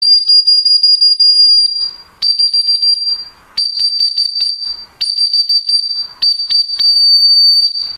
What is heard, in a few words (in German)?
pfeifton